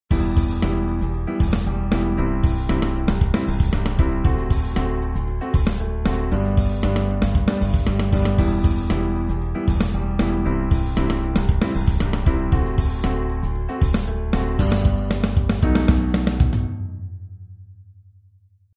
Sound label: Funky Soul